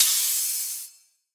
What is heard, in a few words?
Crash, Cymbals; Raptor; Cymatics; Dubstep Starter Pack; Drums - One Shots